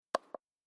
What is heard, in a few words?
Remote Control Click Button Press; Foley Sound Effect